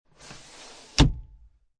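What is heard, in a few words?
Car Interior Sunroof Cover Close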